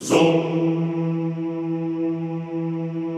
ZOOMMM E2, Classical Choir; Best Service; Extended Classical Choir

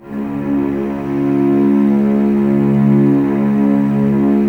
Cellos C#2, String Ensembles, No Vib; Miroslav Vitous

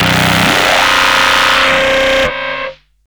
CARTRASH; USB Soundscan vol.01; Hard & Loud Techno; AKAI

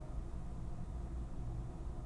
BLOWER, 3D Organs; E-MU Producer Series Vol. 5 – 3-D Audio Collection; 3D Pipe Mix Celeste

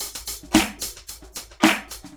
LOOP, 70's Breakbeats; USB Soundscan vol.46; AKAI

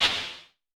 CLAP, Vermona Mono15 Drums

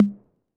606 Tom High; Concrete Collage Drum Kit; Concrete-606

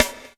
SD, Drum & Bass, 160 BPM

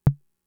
Tom, Fricke MFB-522; TOMS

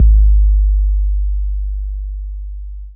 K 808, KICKS TR 9+8

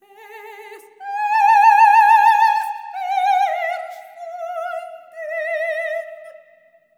SOP. TRAD, Symphony of Voices; Spectrasonics

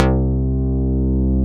mini bass, analog synth bass; Roland L-CD701; Synth Bass 2

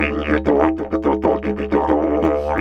Didgeridoo, 93 BPM; Big Fish Audio - Didgeridoo and Other Primitive Instruments

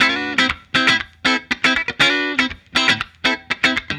Funky Guitar; Sonic Foundry (Sony Creative Software); What it Is! 70s Analog Funk; The Funky Mama

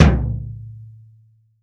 BD, REAL DRUMS, JUNGLE KIT

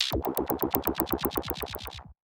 C1, SynthLine; 90 s Sample CDs; NiceBeats; SynthLine VOL-14

